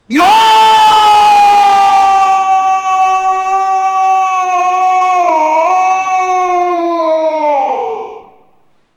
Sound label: the wonderful sounds of a, martial arts